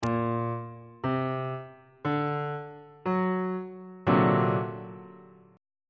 c double sharp augmented 7th 2nd inversion on bass clef